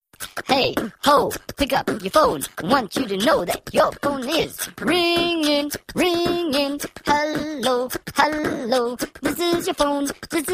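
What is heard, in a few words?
beatbox ringtone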